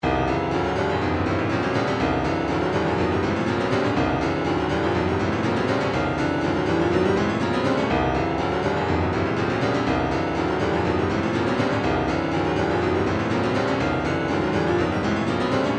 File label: retro funk, midi; wicked bad